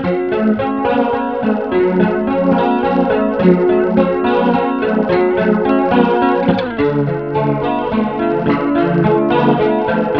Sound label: flanger